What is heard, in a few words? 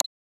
clean ui button click sound